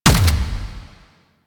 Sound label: Railjack Weapon Fire Start; Modular Weapons